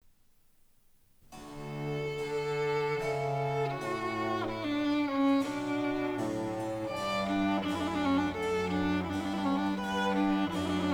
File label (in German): Trio E Dur Affettuoso